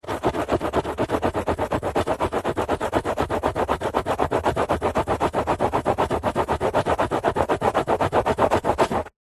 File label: office pen long scribble on card back of paper pad; zapsplat